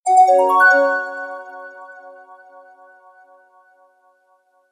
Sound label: calm alert